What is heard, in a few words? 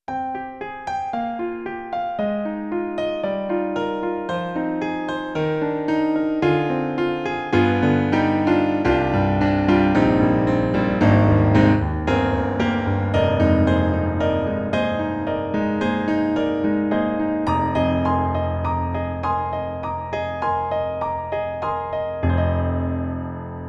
Piano; Roland; SonicCell; GM2; Piano 1w